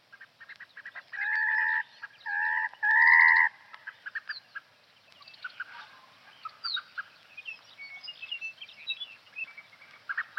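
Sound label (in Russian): chernozobik golos, dunlin voice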